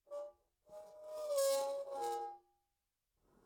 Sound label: Metal Gate